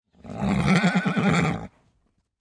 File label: horse pair vehicle ignition